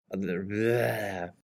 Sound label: pukey